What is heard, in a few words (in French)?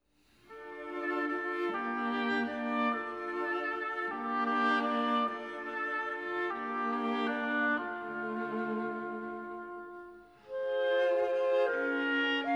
instrumental